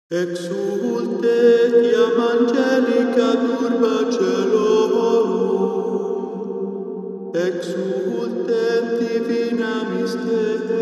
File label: ArcheoEchi Partial audio Binaural; 44.1kHz; Stanford